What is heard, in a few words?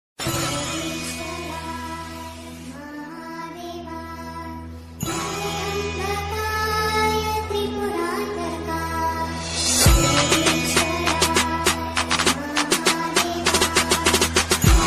om namah shivay ringtone dj remix trance remix ringtone; mahadev ringtone